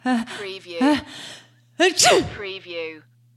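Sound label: Female Sneeze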